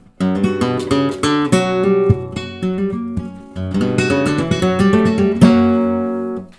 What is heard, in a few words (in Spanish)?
falseta